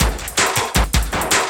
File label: LOOP L, Drum & Bass 160 BPM